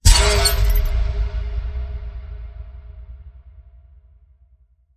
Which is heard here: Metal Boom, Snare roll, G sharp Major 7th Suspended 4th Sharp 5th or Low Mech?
Metal Boom